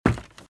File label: Footsteps - Wood - Creaky - Run - Right